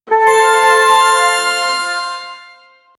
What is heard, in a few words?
game sounds